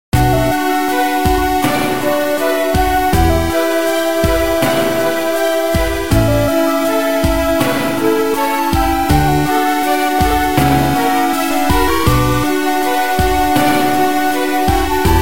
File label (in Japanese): ゲームオーバー; power pro kun pocket 1 2